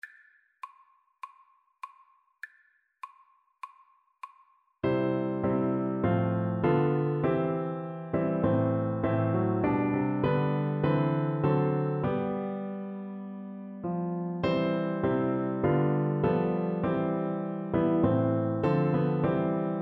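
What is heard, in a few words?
all things bright, alto saxophone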